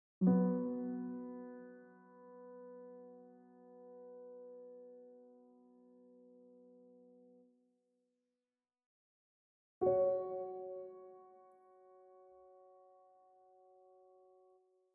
PIANO ACCENT

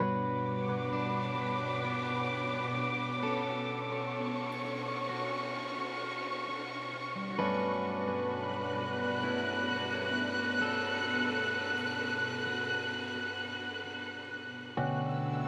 STRINGS HIGH; Kontakt third party 5 demo song opacity

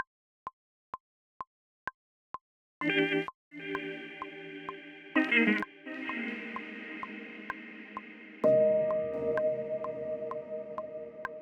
piano reverb on; replika

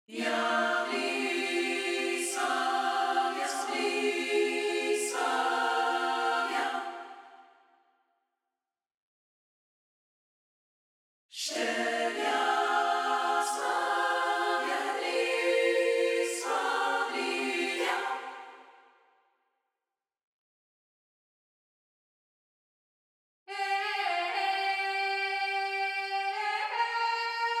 SONG VOCAL ONLY; KONTAKT THIRD PARTY 2 DEMO

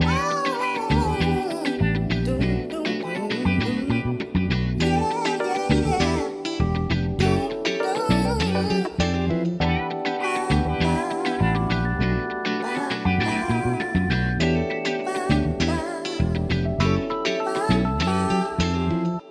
FUNK GROOVE SAMPLES SONG DRUMS OFF

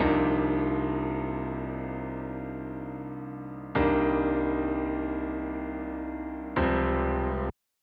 REVERB PIANO OFF; rverb

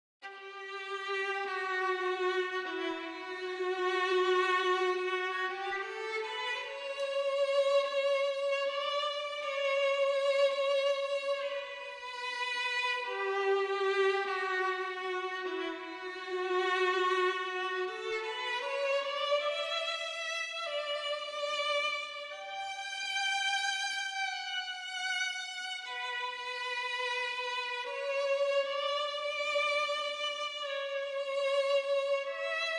VIOLIN ONLY; Kontakt third party 3 demo song

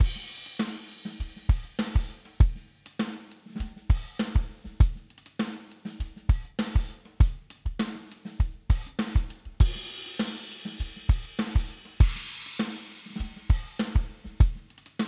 drum on; smack attack

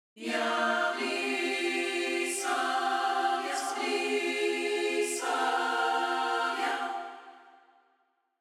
VOCAL ONLY; Kontakt third party 2 demo song

